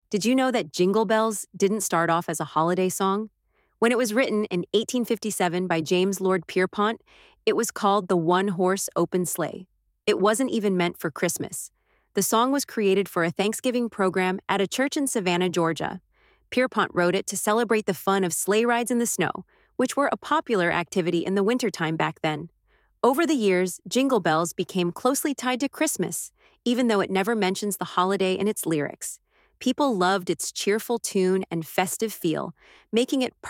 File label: Jingle Bells